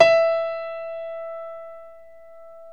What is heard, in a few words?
PNO XYPNO, Grand Pno, Roland; Club-50 - Foundations; Grand Pno 3